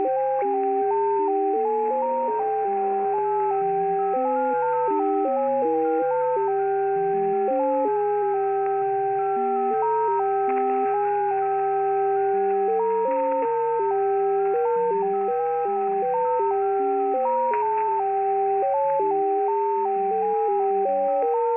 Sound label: Creepy Melody